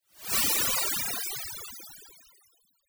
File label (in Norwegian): Sildre